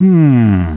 humm; BattleSNDS

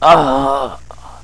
DYING; BattleSNDS

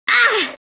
hit; BattleSNDS

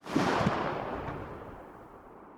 echo dense

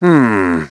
HUMM; BattleSNDS

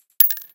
case, 6x35mm; Caliber

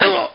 hit; BattleSNDS

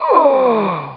DYING; BattleSNDS

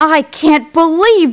LAUGH; BattleSNDS

